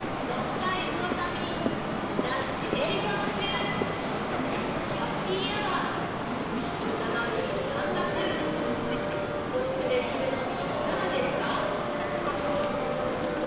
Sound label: pachinko; sendai arcade; uotami